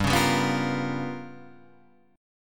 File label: G 7th Sharp 9th Flat 5th G; G7#9b5 strummed